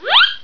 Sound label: zip; 7invasores